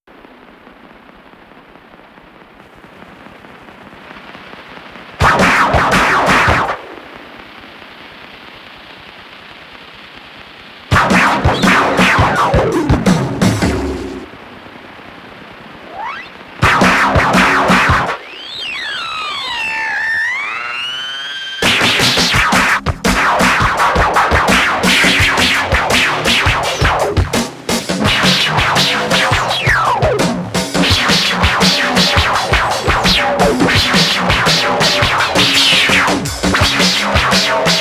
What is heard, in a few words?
Drums Through Moog